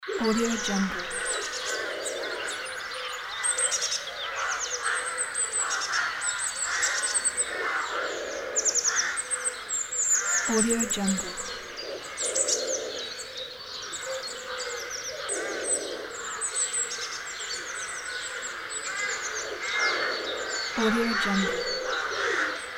city birds ambience

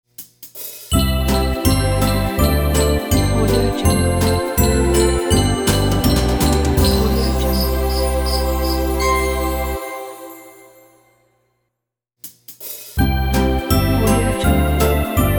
sleigh night logo

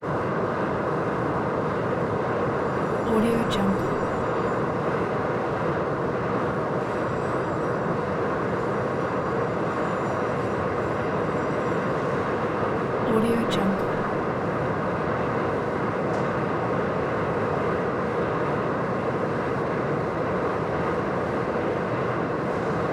stove airy blast distant phone ringing

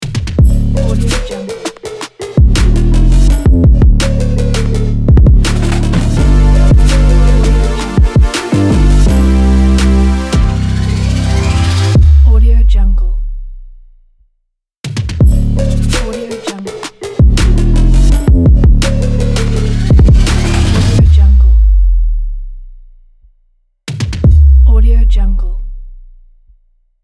modern mainstream powerful hip hop trap logo

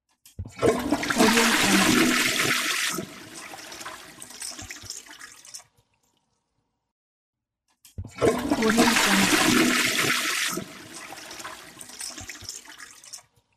toilet flush

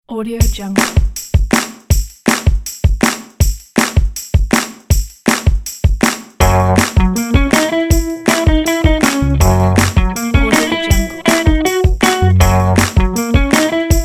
happy fun r b

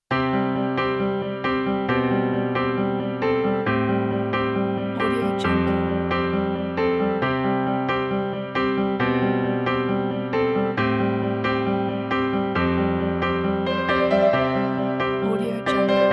motivational positive piano

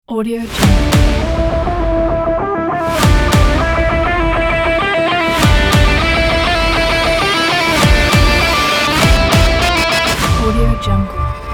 epic sport rock kit

